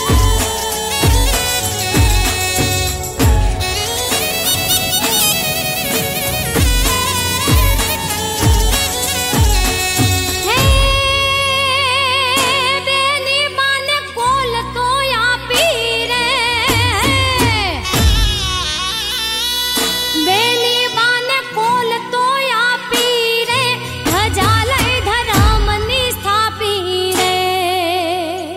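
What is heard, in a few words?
Gujarati ringtone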